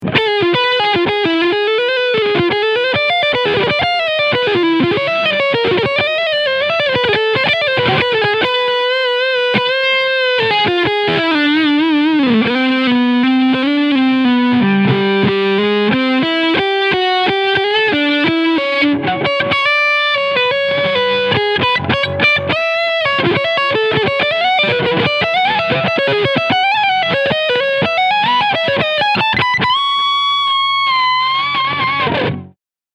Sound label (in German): Schecter C 8 Multiscale Leadsound beide Humbucker